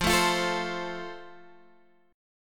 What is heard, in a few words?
F Major, Guitar Standard; strummed